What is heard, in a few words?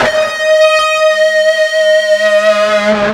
FUZZ D#4, Guitar; East West; Steve Stevens Guitar Samples Collection